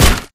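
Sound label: impact bullet light ballistic android; Titanfall 2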